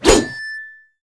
Soul Atk; Naiad; Gamigo

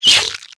King Spider Dmg; Seer; GamigoNA

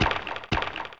Skeleton Run

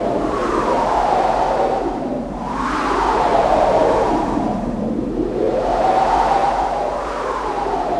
Wind Nepilim stand

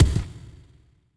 Spirit run

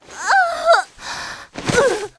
die; Seer; GamigoNA

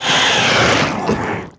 Die; Acon; Gamigo

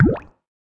Slime Idle

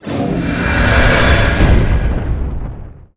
Fire Pamelia Heal; Seer; GamigoNA

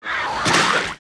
Mush Room Die; Seer; GamigoNA

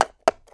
Rein Deer Walk; Seer; GamigoNA

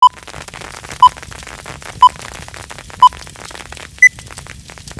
Mine Range Main Idle; Seer; GamigoNA

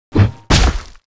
Knockback

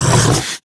dmg; Kruge

